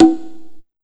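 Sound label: 127 drum 2 R, Fresh Disco House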